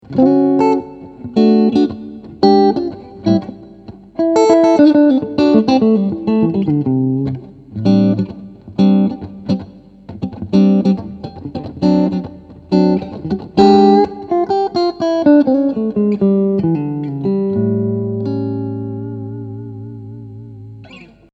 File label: clean; TremLord